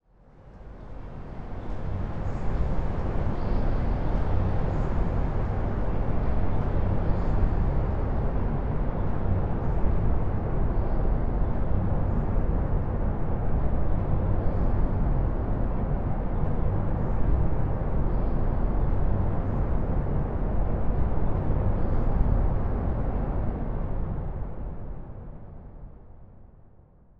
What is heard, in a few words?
Texture Dense Dry Fog; living air